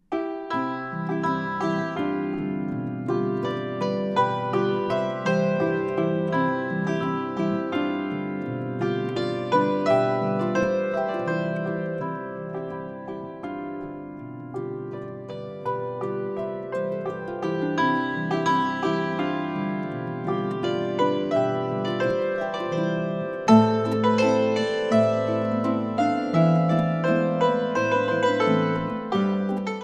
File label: Boarischer, auf Harfe & Hackbrett